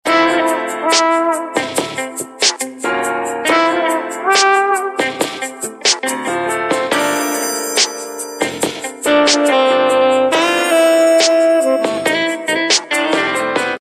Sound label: Ringtone, Samsung GT-S3310